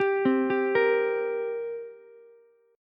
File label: G Minor Double Flat 5th, down, Ukulele; arpeggiated; JGuitar